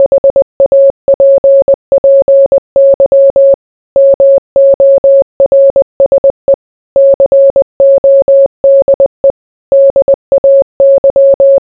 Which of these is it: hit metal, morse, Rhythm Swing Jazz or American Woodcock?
morse